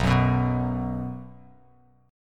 B 5th B down Bass Standard; strummed; JGuitar

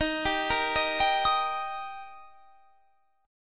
D sharp Major D sharp, chord; D# arpeggiated; 23,22,20,20,20,23

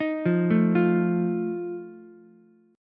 E flat Suspended 2nd Flat 5th, Ukulele; arpeggiated; chord; 8,5,5,8; JGuitar

